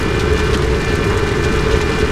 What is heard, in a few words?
Cannonball Fly Loop; Weapons